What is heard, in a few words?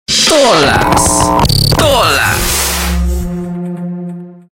jingle